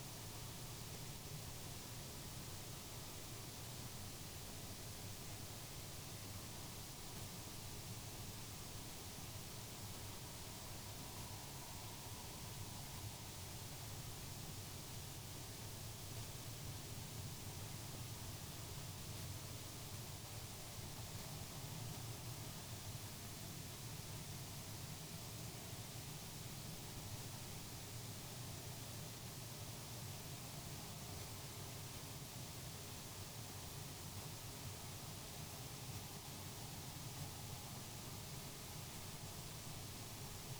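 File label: hydrophone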